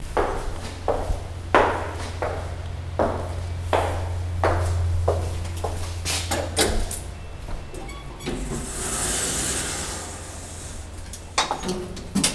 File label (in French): Comptine Cambodgienne; atelier berceuses du monde